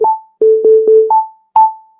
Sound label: outgoing call